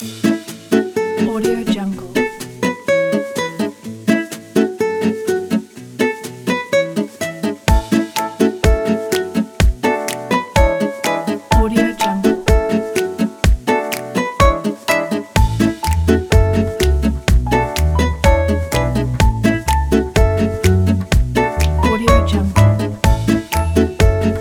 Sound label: cooking show